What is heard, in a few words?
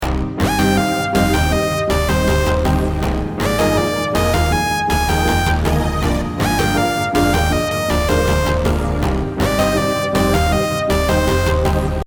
Melody Harmony